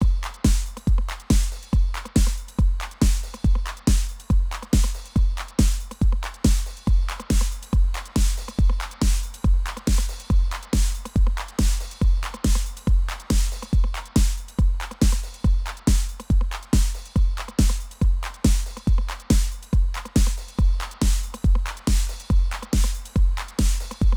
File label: att Fast, rel 200, Soft, Bass (7dB gr)